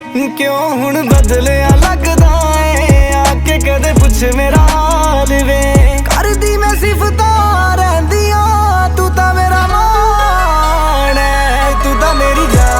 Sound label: PUNJABI SONG